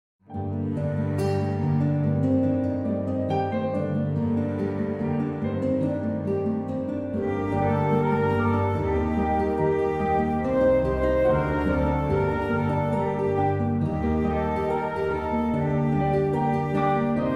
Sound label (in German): Instr.; Lied zur Jahreslosung 2016